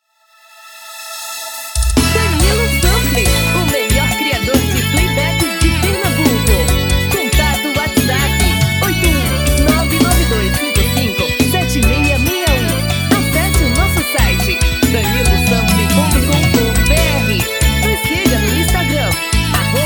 brega

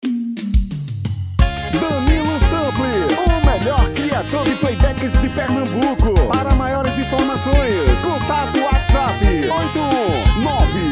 tom feminino Xote

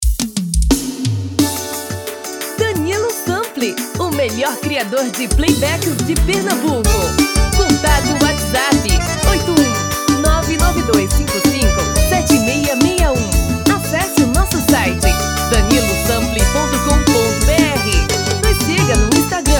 feminino

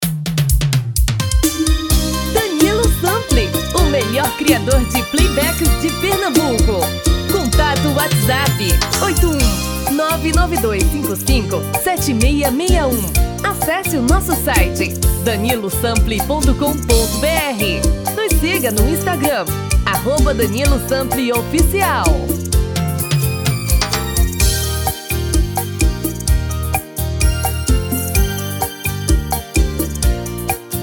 Serestao

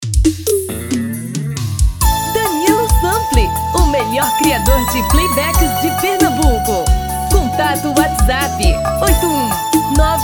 Arrocha

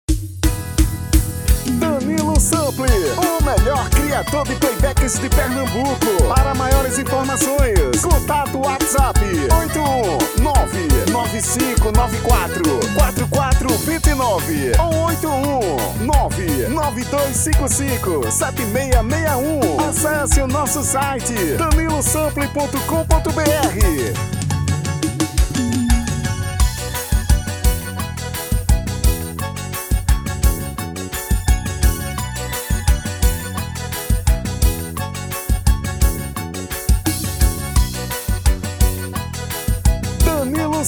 Versao Xote

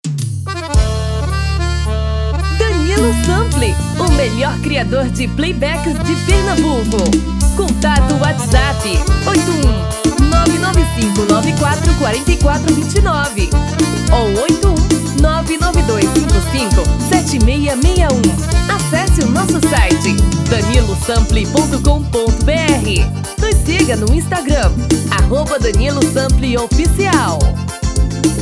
Forro das Antigas

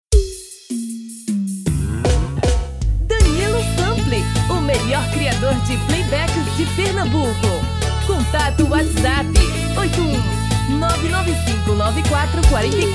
Piseiro

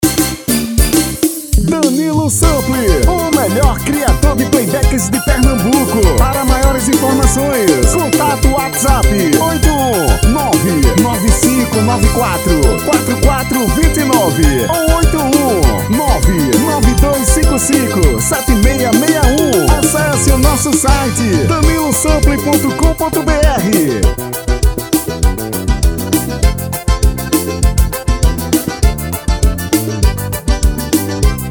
Forro das antigas

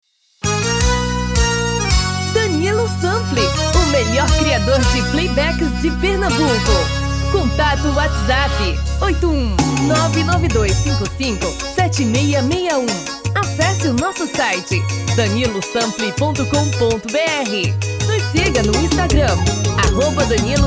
VANERAO tom masculino

